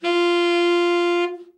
tenor soft, sax; Giga Samples Collection

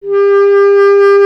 B. Clarinet

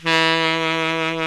TENOR SAX

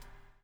BUBL; Spectrasonics - Backbeat

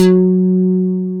Dynamic Bass; Best Service Gigapack 2

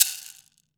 wood shaker

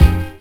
HIT, Hits & Brass

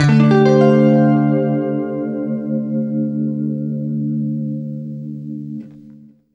CLEAN DM9, Guitar; East West; Steve Stevens Guitar Samples Collection

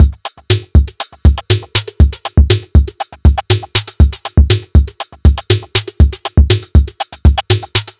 Crispy Beat, Drum Loop; Sonic Foundry; The Electronic Music Manuscript Collection; Drum Loop Structures

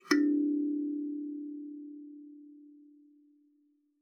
KALIMBA D4; Xsample VOL-7

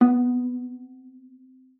B3, VIOLA PIZZ; Xsample VOL-5; 90 s Sample CDs

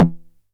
909 SD Tape

